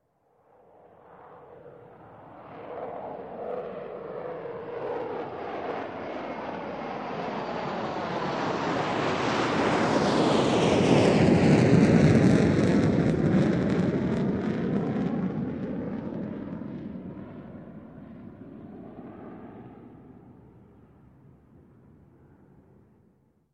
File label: Large Jet Take Off; Sound Ideas; Series 2000